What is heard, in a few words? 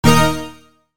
break and chain